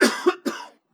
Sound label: cough male